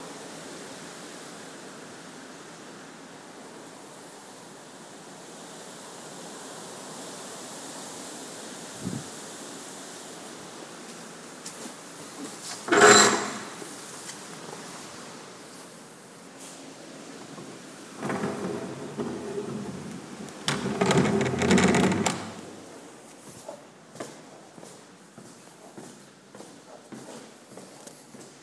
creepy night

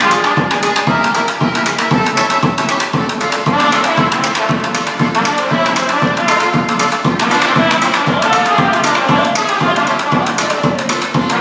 buskers

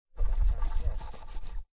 rustling

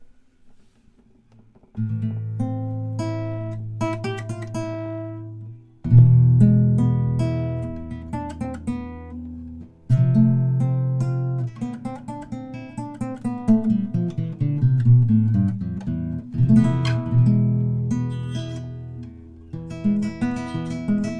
flamenco music

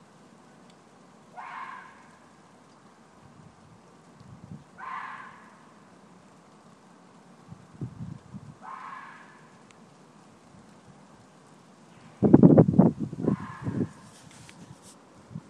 fox calling; dun laoghaire

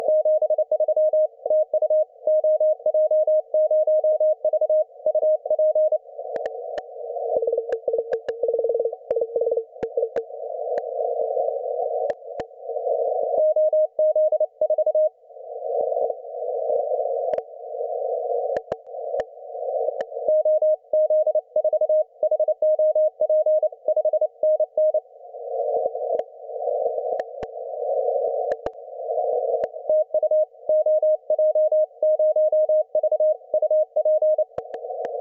20m CW; oj0v market reef iota eu-053

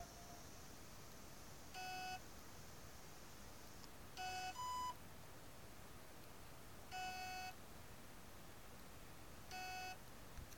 hard drive

